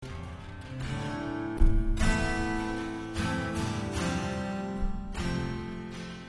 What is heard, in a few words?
Strummed Am,F 77